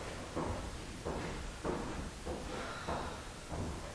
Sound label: breathing